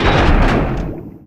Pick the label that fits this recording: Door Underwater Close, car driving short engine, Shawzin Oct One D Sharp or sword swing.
Door Underwater Close